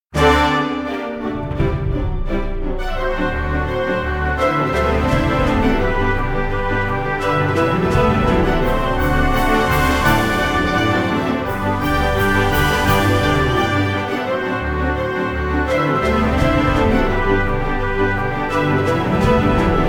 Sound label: Polka